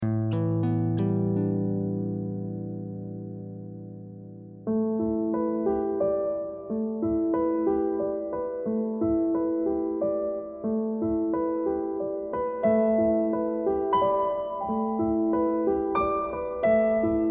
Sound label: Piano Instrumentals; hotusa recepcion y pasillos